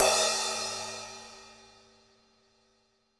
New Brush Crash, Cymbals; Roland BK-5

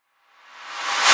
rise noise swoop, transition; Polyend; Recue

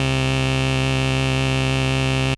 Synth C, Synth Prophet One Shots; Electronisounds

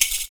FOOT PERC; Univers Sons - Raricussions

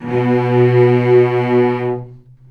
VCS ATK B2 L, VCS ARCO, String Collection

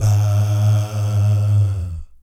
VIB FX, Vocal Planet Jazz & FX; ILIO; JAZZCOMBO

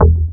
DR. LOOP, BASS; X-Static Goldmine 3

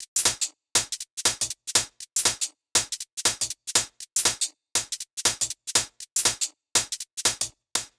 Hh Sh Loop 120; Equipped Music; Loungin House

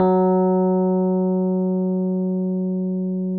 RHDSM2 F#2, Rhodes EP; Sonic Reality; Vintage Timetraveler; RHODES EP2

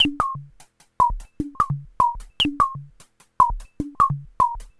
Percussive

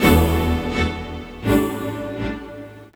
Strings Pump; Sonic Foundry (Sony Creative Software); Knutrix Drum & Bass

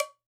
GJ PERC, Percussionworks; Sampleheads; NYC Percussionworks; GJ PERC VOL1

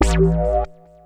dance, bass

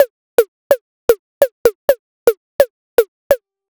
Ghetto Tech, Synths; Sonic Foundry (Sony Creative Software); Underground UK House & Electro

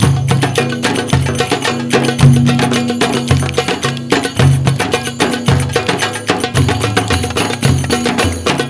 Arabian Mix 110, Ethnicity; Sonic Foundry; Hip-Hop Street Beats Construction Kit

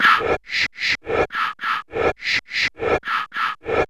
VOX LOOP; AMG - Vince Clarke Lucky Bastard

